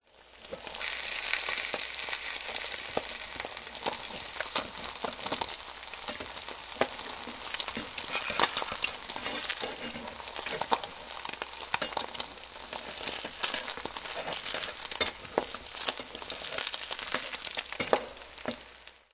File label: eggs frying omelette pan